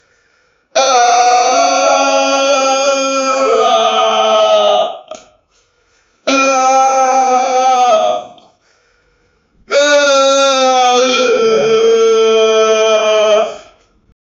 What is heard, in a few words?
medieval man pain cry